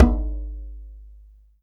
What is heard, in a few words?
Djembe Hit, EMU Orbit-3 drum machine; 300 Drum Machines